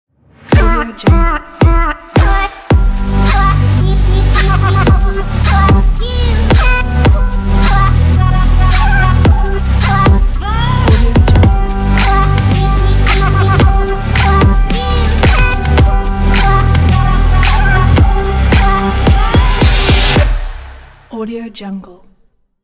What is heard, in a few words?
stylish promo opener ident